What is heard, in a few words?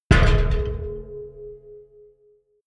massive metal gas bottle low